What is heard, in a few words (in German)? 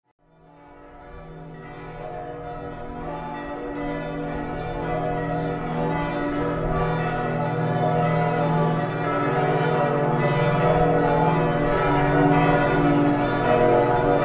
Glocken; St.Lorenz